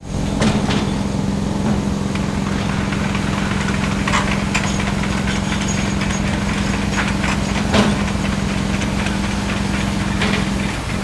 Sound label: construction site